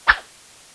fire, weapons; nstar

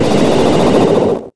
jet

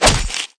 knife stab hit body

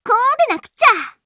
reloading; niconi

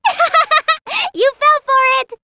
ahaha

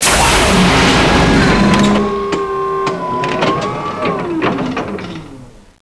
door silo

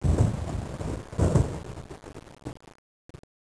thunder

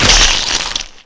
crawler bite

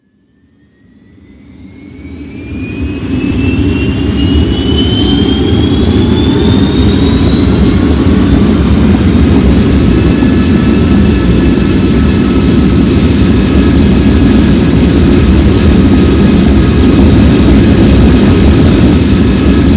air tube

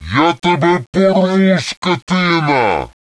attack, troll

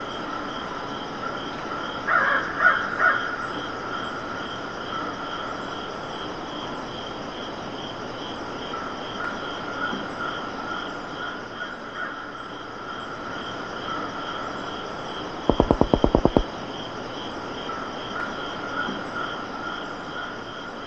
swamp

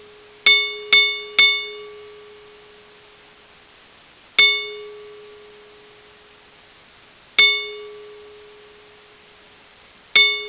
bells; gman

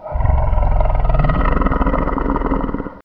pe idle

